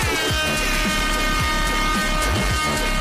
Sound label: air horn; 420noscope style